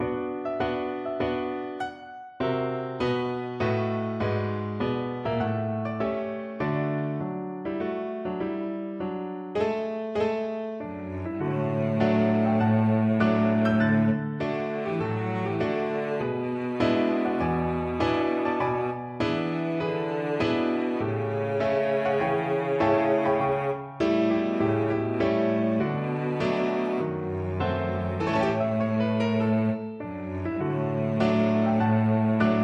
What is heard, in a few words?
cello